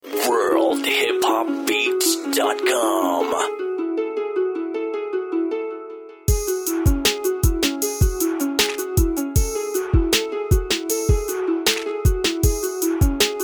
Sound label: Rhythm 78bpm